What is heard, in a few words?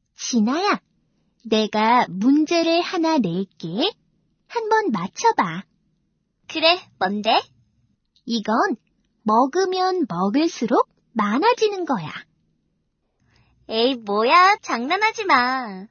pop